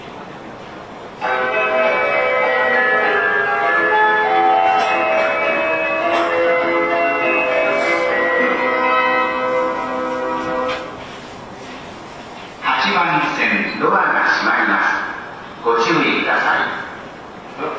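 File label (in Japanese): 池袋駅, メロディ; 朝4:30発の始発電車にて; 山手線ホーム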